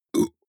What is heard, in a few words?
burp very short; koerpergeraeusche; menschen; soundpool